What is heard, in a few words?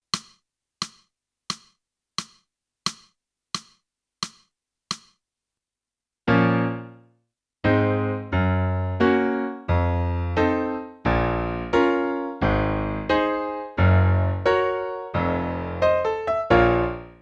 clarinet